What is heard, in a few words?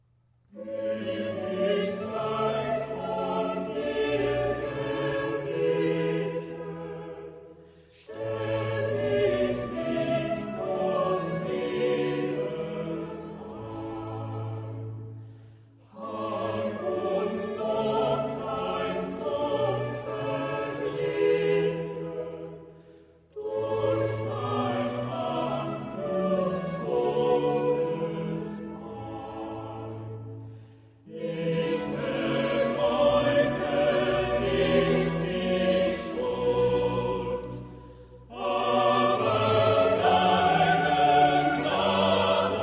Chorale; BWV55; Bach; Cantatas I